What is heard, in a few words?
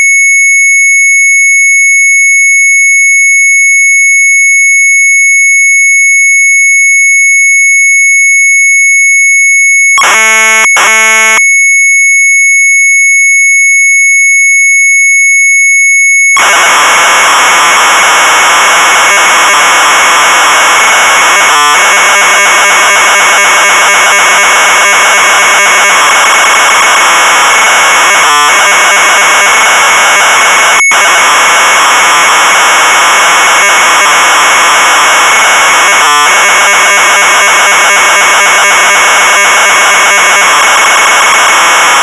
Sharp MZ800 Tape